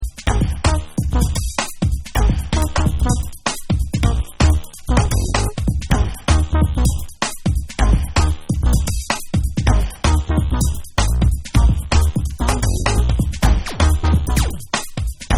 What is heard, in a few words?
Dub